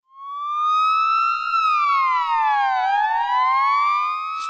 police

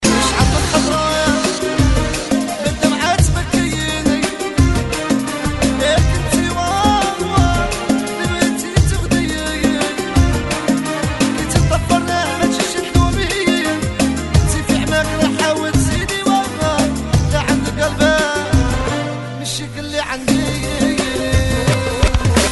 Algeria Music

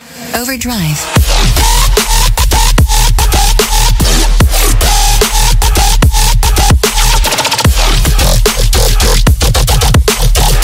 Overdrive

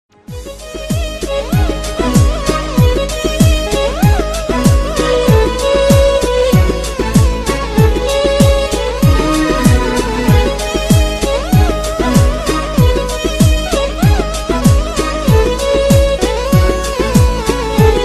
Kurdish Beat

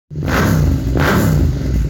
Yamaha MT 07 exhaust

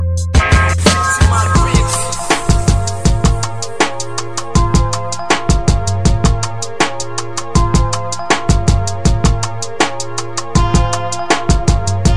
Beatz

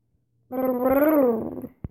Purring

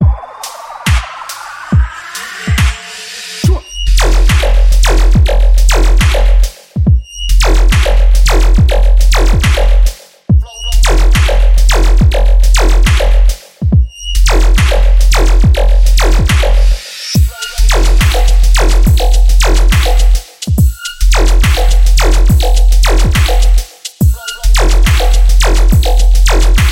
Laser riddim

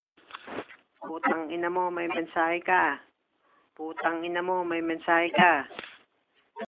New Sms

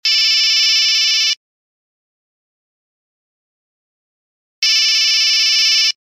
Nokia Low

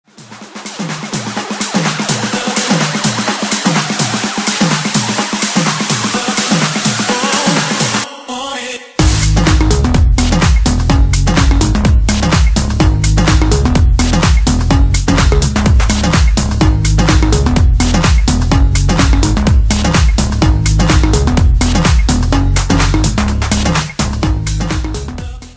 Wrong

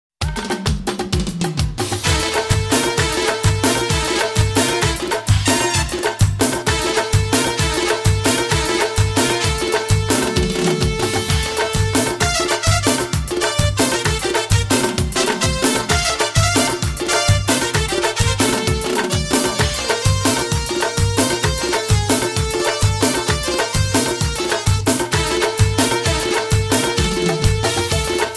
Afghani

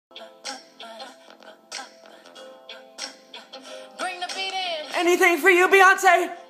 bring the beat in

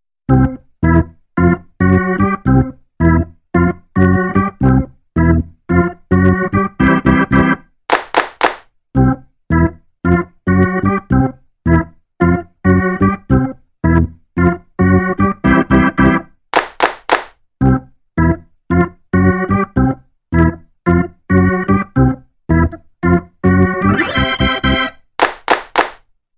Clap Organ

